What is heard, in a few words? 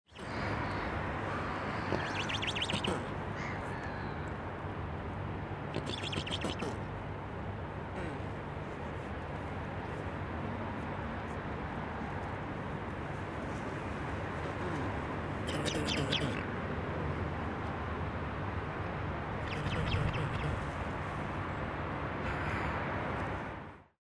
grunting and whinnying swans